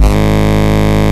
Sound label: Bass, Root G#; Vengeance Samples